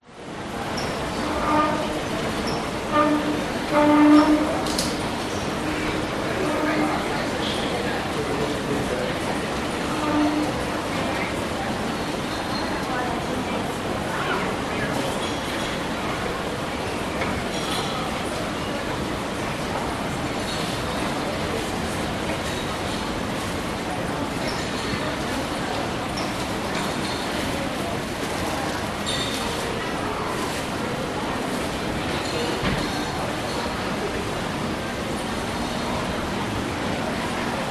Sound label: public places mall busy close food court by escalators people chairs scrape inter; internal sydney